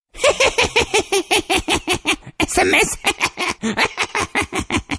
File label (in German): Hehehe Sms Lache